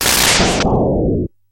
bolt; mmx sfx

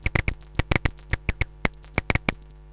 Knaak, Loops Texture; Ableton Live 12 Lite; Core Library

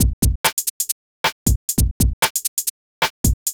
Two Step Garage 135 bpm, drums; Loops; Ableton Live 12 Lite; Core Library

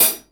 Hihat Closed Loose Dirty

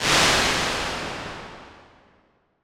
Halls Soft Hall; Impulse Responses; Ableton Live 12 Lite

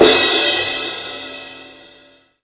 Crash Real, Cymbal; One Shots; Drums; Ableton Live 12 Lite; Core Library

